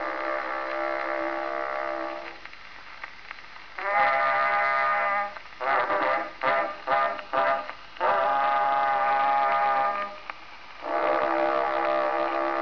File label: lur